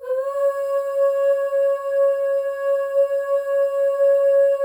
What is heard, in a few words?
OOSC, FEM OOS, Voices; Symphony of Voices; Spectrasonics; FEM.2 OOS